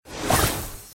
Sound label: find relic